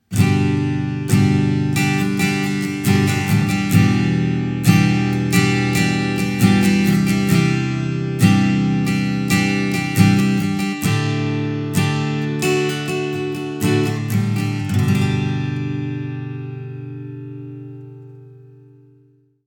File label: STRUM